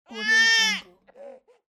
baby cry scream single

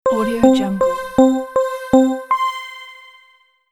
essential countdown

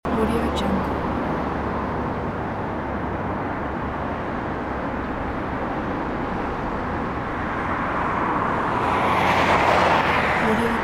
motorway traffic short loop; urban sounds

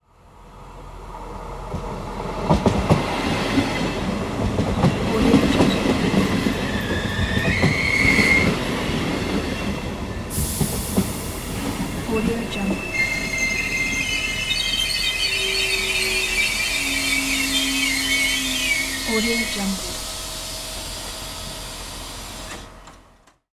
train stopping; قطار در حال عبور; urban sounds

